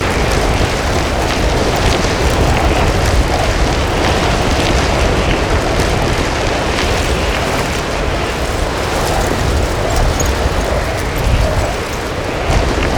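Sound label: tornado loop; smite; kukulkan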